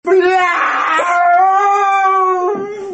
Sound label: bliaiaiaiaiaaaaaaaaaaaaaaaaaakh